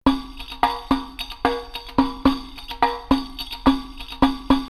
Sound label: Luxor 108, drumloops World & Ethnic; X-Static Goldmine